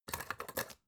folder clamp flip

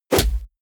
heavy punch

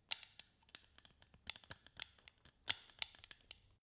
scissors handle

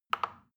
plastic button tiny click soft fast

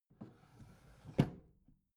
bathroom drawer open soft

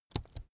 keyboard laptop space press slow soft

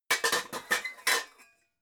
pots handle

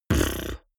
beatbox bass drum wobble damped

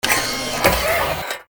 machine burst

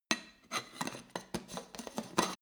hammer indoors handling

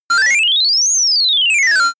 general rise and fall short

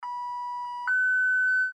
car alarm

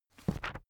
book browse smooth

